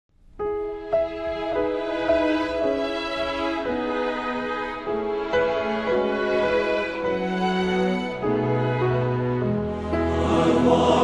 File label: men's chorus